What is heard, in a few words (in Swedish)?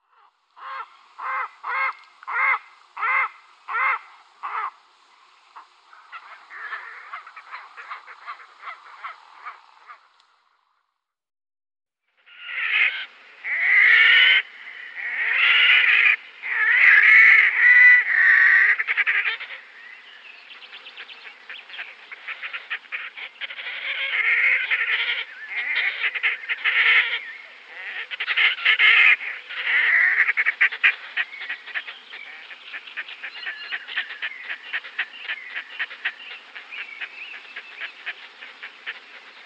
Grahakedopping, Faglar